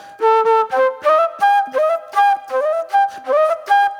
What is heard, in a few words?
Soul Plate Flute